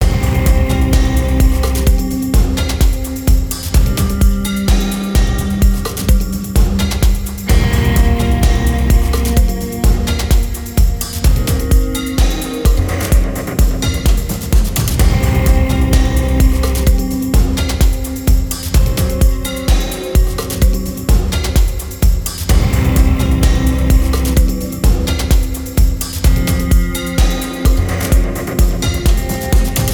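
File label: Somewhat Sad Edgy Ghostly becomes industrial; CUES for film TV games etc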